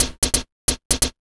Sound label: DRMSND, Pure Electro, BEATKIT